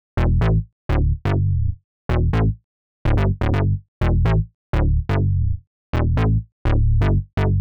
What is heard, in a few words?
Synth Bass E, 125; PowerFX - Nu Jazz House